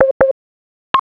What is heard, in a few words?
jumppad warmup